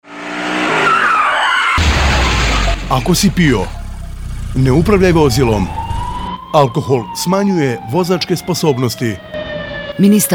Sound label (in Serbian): radio džing; SRECNA NOVA BEZ ZRTAVA